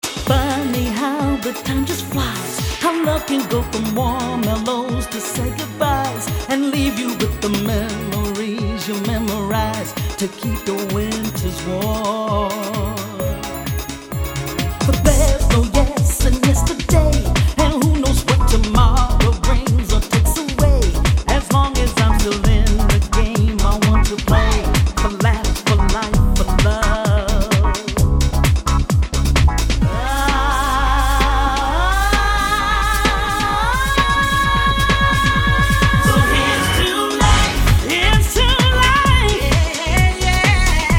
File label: House Adult